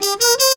brs c, brass; house; PS-28 Vocal House Samples

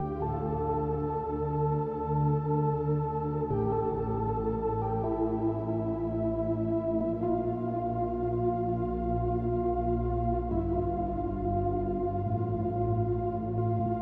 137BPM Soft pad WET, D minor; Vengeance Samples; Universe Kit

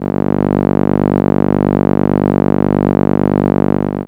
Contra G1, ARP Solina, contra bass; musicradar-synth-samples

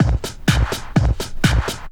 drum loop 125, heavyhouse; PS-28 Vocal House Samples; drumloops

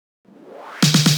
128BPM Sickbay, House Electro Fills; 1Bar; Vengeance Samples

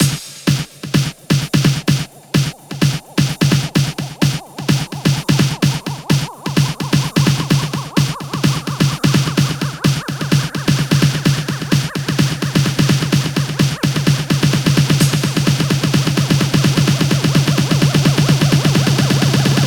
128BPM, House Electro Fills; Hammerhead 2; 12+1Bars; Vengeance Samples

